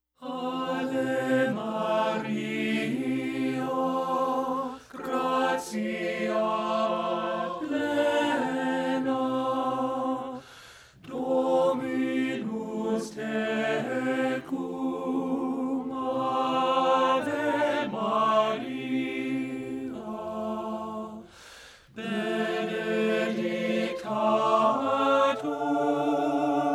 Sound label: AVE MARIA TEN